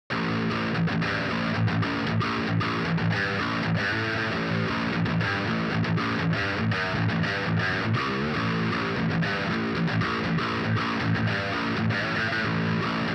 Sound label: 7 string riffing, extended drive